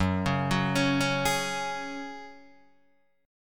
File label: F sharp Suspended 2nd, Guitar, DADGAD; down